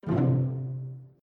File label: wrong